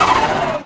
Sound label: FX TURBO, Rally; PC Gamer 49